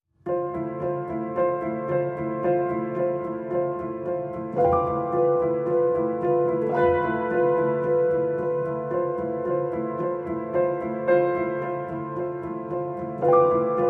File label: Piano